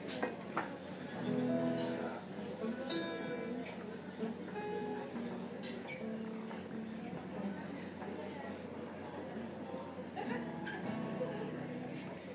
market stall holders having a jam session; walking around york looking for sounds

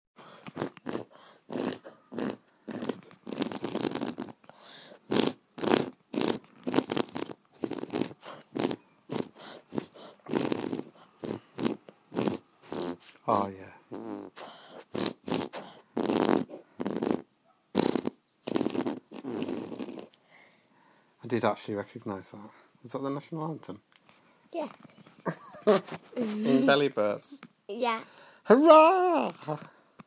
belly burps

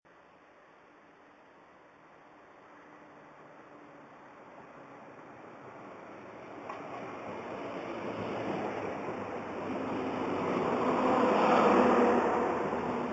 test diesel train

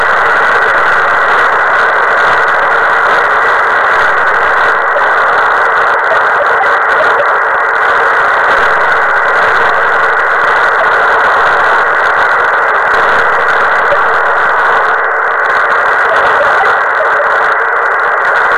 milsat downlink 249.174 MHz bubble data signal

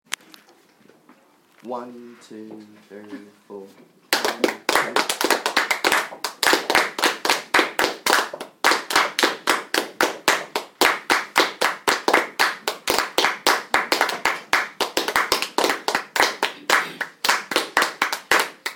rhythm composition

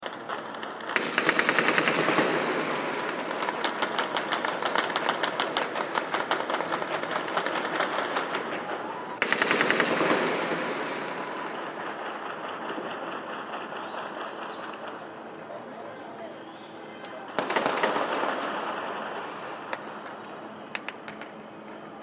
gaza ceasefire celebratory gunfire

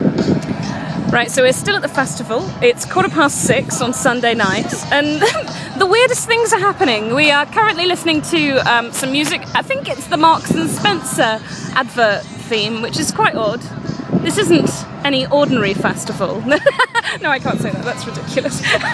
random festival sound check

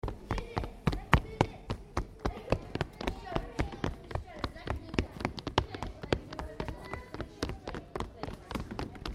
running

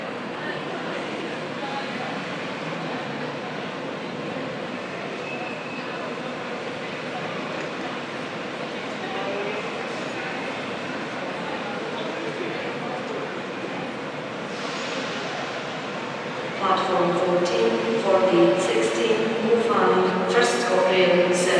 waverley station edinburgh

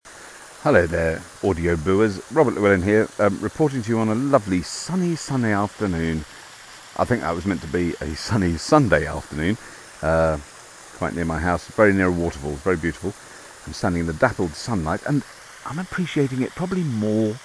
waterfall

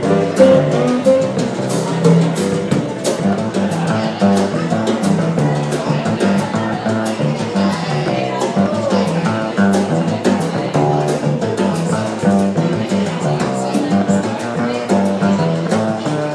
jazz, Cape Town; hq bree street

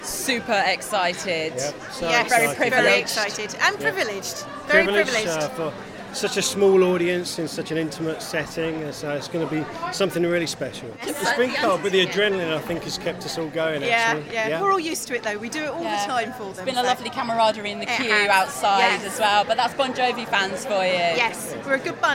fans wait for the band in concert; with radio 2

